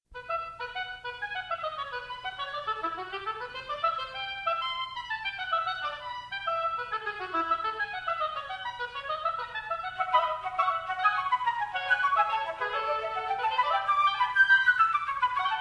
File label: Duo for Flute & Oboe Fuga